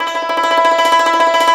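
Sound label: YANGCHIN tremolo; INIS - Opium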